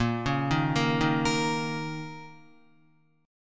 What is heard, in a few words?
A sharp Minor Double Flat 5th, A sharp, down, Guitar; A#mbb5; arpeggiated; JGuitar